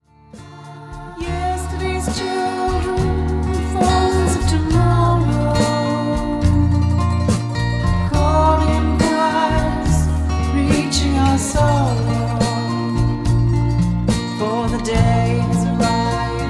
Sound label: overwave